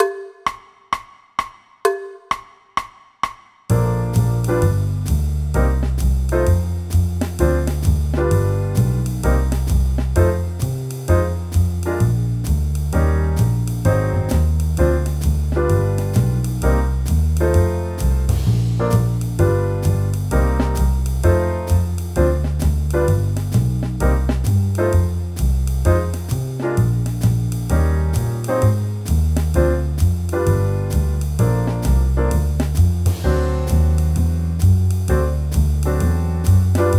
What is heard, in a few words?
Trombone Playalong